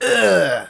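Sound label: electric pain; wizardwars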